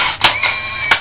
AUTO WIND, MACHINES

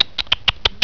TSKTSK

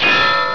CLANG; IMPACTS

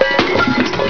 CLANGERS; IMPACTS

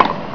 BALL

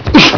exert; PEOPLE

